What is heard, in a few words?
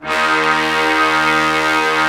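Brass Ensemble mute; Roland L-CD702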